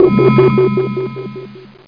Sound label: fear; SimCity 2000